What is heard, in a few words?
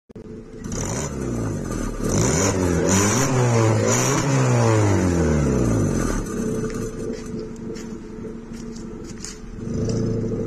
b520 exhaust